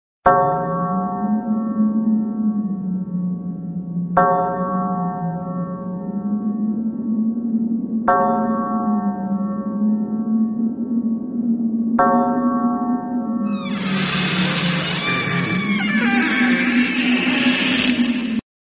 horror bell

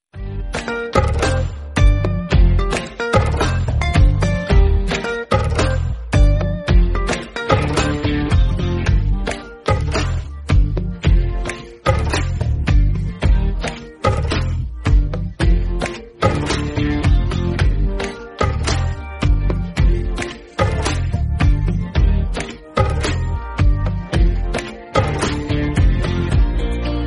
instrumental

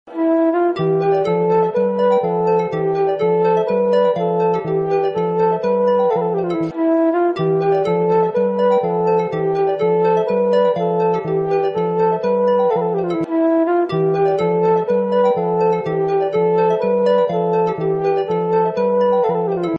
travel flute instrumental bgm

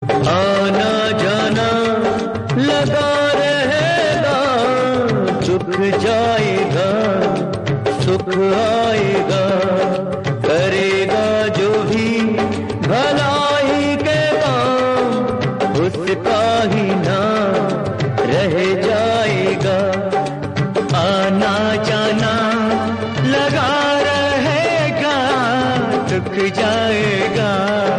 hindi song; Giraftaar

